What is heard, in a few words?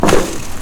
debriz, weapons; Dystopia